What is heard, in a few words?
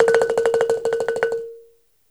BALAFON